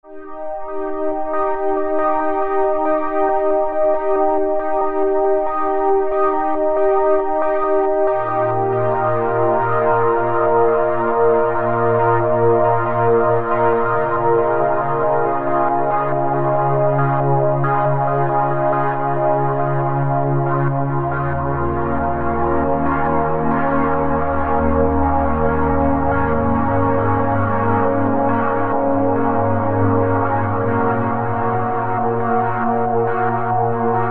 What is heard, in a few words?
SYNTH PADS; Floating Embers; Apple GarageBand 2